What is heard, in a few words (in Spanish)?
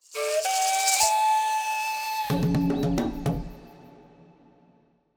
musica audio logo